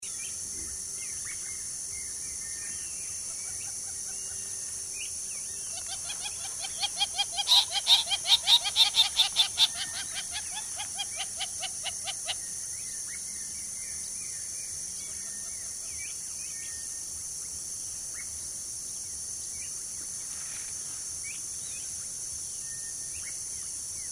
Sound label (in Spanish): Jacana suramericana Jacana jacana